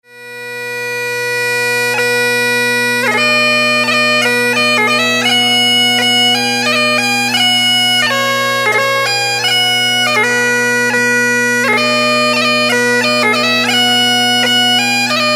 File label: on Bagpipes